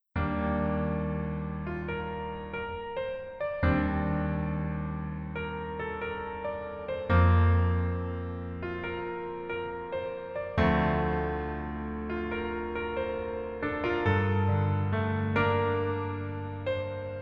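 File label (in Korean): Piano version 멜로디MR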